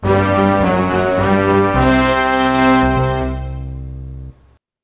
music ring; TyTheTasmanianTiger3; XBOX

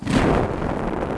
character aflame, foley

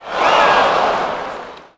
NEG Reaction,Crowd; Cars; XBOX

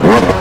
throttle blip hi, YAMAHA

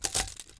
blaster hit concrete; objects; Star Wars Obi-Wan; XBOX

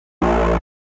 health low; WhiteOut

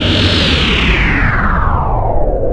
deflector deactivate; Star Wars Clone Wars; XBOX; Xbox Live Effects

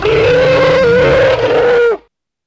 Carcha ROAR, Dino

